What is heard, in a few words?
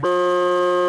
laggy